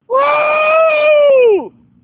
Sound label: Men Black Scream Tunnel